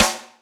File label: cuba, snares real